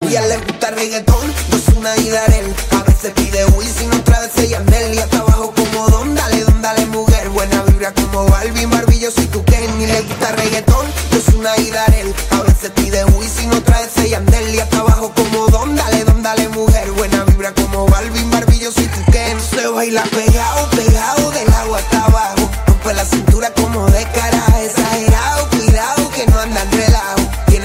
Reggaeton

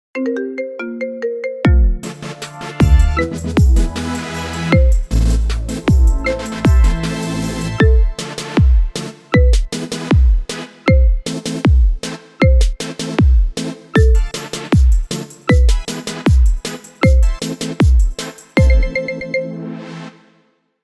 Marimba Remix; MagnusTheMagnus; iPhone Commercial

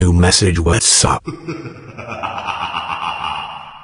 whatsapp halloween

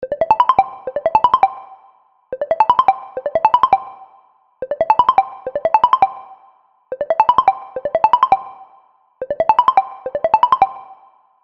ringtone; nook phone; meloboom

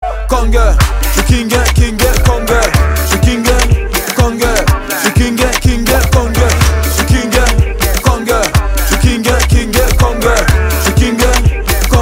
AFRO TRAP

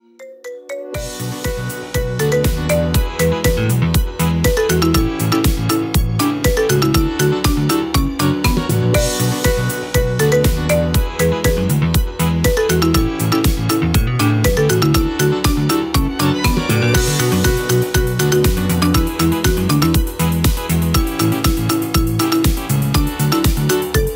Marimba Remix Ringtone

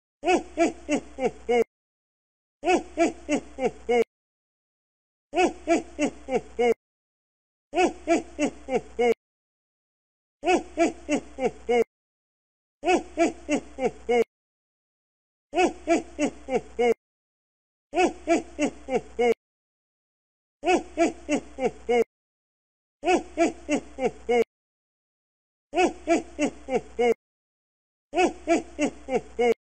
christmas laugh santa claus

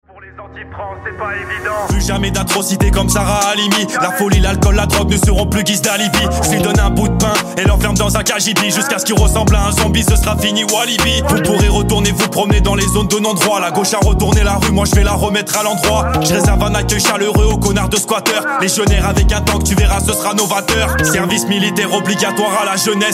hiphop

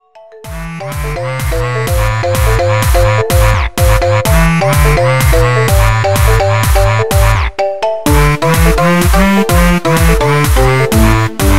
Marimbas

